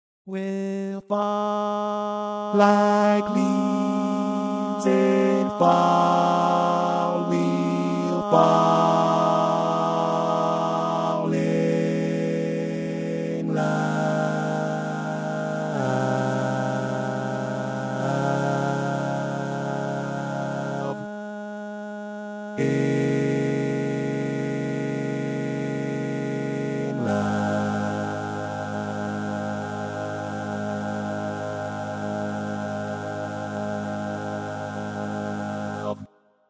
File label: Bass, barbershop